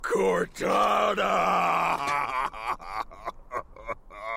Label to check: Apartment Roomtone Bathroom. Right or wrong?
Wrong